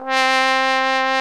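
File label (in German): POSAUNE VIBRATO; Best Service; Alpine Volksmusik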